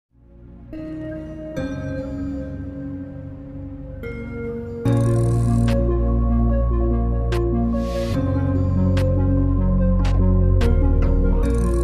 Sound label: Relax The Dreamy Atmosphere Electronics, For Ringtone, Superbass